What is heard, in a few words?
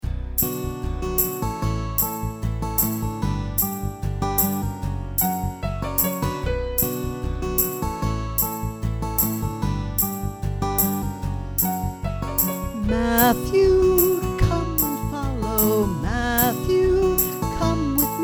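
Come and Follow B minor VOCAL; Matthew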